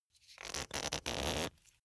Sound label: glass bottle close aluminium lid tight